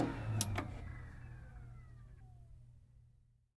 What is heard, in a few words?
shelter computer off; Original TK Sounds